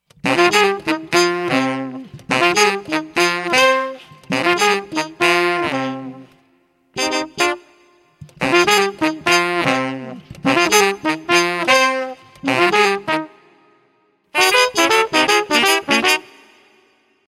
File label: Horn Sample; Horn for Hire Demos